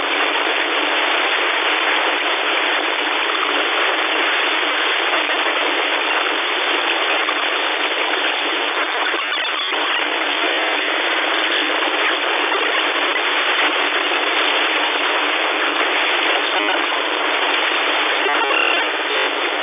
Glitchy Static

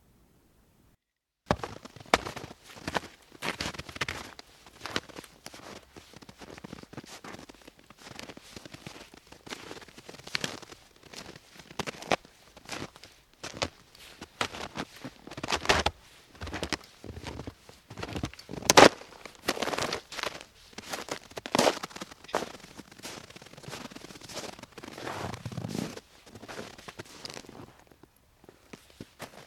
Snowwalk

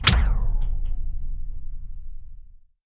Harpoon Deploy Swoosh

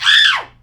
Shriek

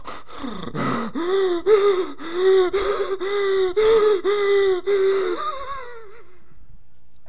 Monster

